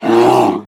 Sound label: Bear Attack; Creatures